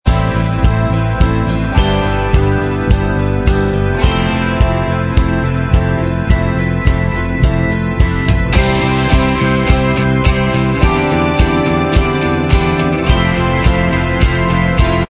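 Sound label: instrumental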